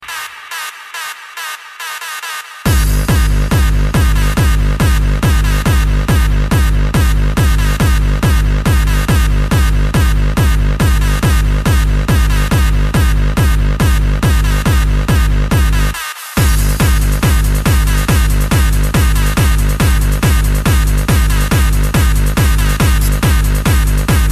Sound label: Hard Trance Remix